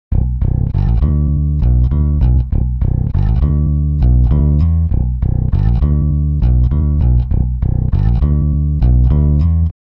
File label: bass; usb uvi workstation